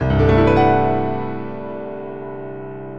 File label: fifths